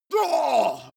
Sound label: male fight moan painful; soundpool